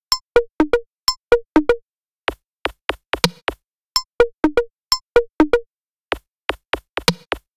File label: Housed Beat M